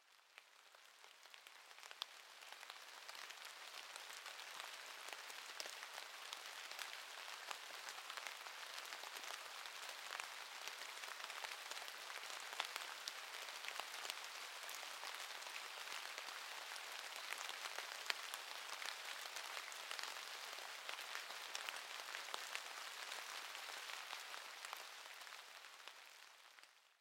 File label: rain